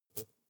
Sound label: hornet hum short hard